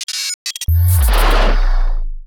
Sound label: power up shield; Allegiance